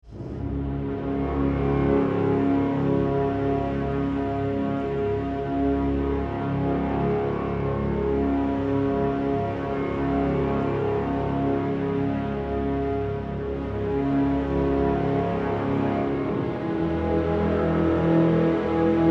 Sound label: Bleak Orchestral Ambience; Monument Studios; Exoplanet